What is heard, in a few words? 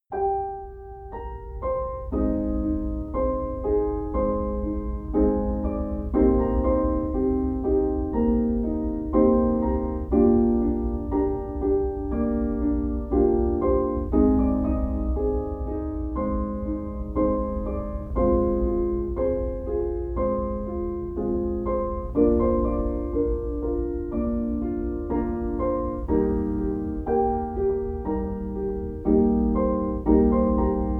Chilled Jazz Classical Music Orchestra